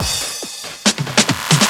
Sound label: Electro Fills; Electro Fills Packs